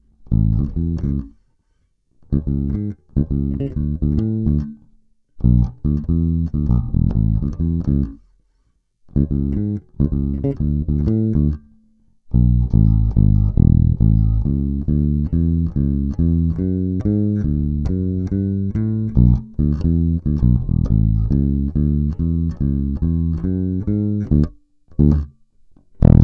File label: 750N - Black nylon, bass